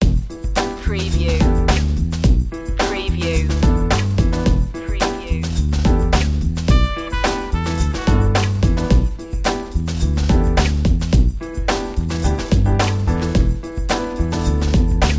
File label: cool acid jazz